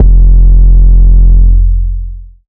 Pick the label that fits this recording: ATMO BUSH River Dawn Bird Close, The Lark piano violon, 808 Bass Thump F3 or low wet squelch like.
808 Bass Thump F3